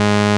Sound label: P6 SUB, P6 SAW, Vintage; INIS